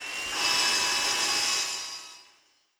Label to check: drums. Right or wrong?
Wrong